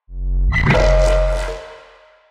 neuro, bass

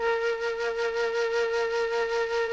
CHAMB FLUTE B3; Sonic Reality; Vintage Timetraveler

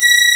STRG E6; East West; Global Sounds

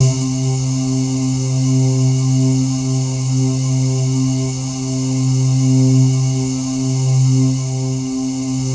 C3, Synth Leads

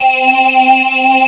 VOX PULSER, DANCE; Pro-Rec

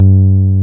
TB303, TB-303 BASS

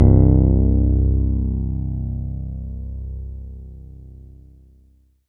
C1, Synth Line; NiceBeats

